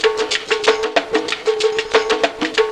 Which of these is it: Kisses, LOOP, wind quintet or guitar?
LOOP